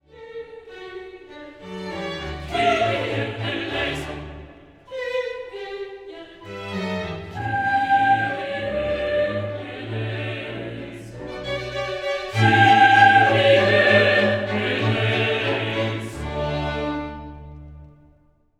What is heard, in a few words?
Kyrie end